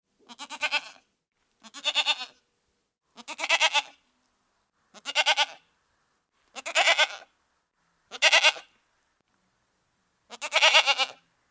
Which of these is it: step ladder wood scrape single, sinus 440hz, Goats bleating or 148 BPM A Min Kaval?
Goats bleating